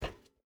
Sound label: footstep burlap metal walk; Rust